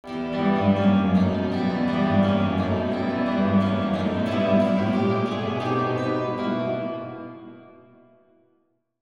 velvet guitar

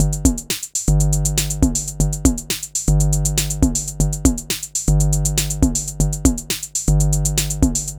drums 100hz

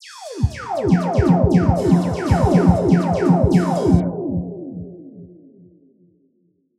drummix

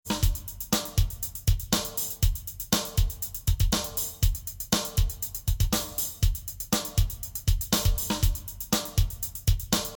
drums azimuth 45 spread 180 incoherent

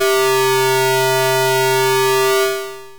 triangle mod

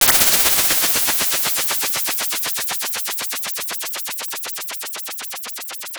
impulse response

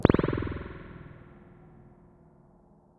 Spring

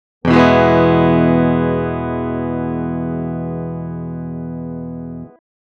SYNTHETIC E major Pickup Neck, electric guitar; electric guitar synthesis

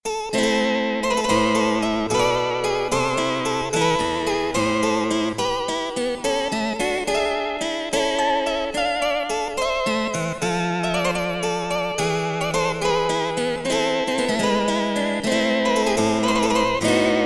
harp flutter; antiquing

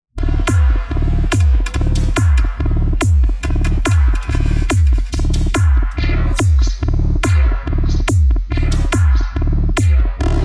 techno

